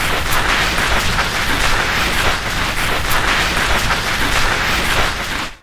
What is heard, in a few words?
slide down hill; horror